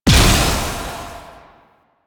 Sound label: Rifle Projectile Explosion; Weapons; Warframe; Duviri; Incarnon Adapters